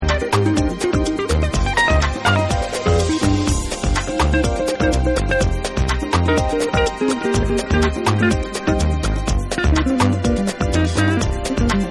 Guitar Dub

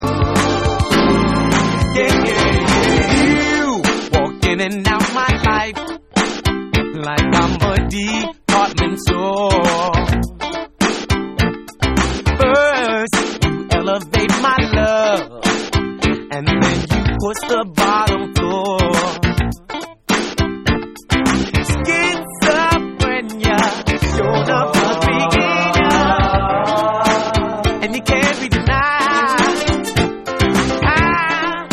Beat Box Vocal

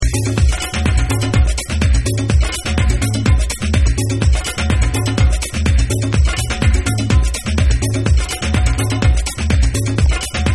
BOSSA, House Mix

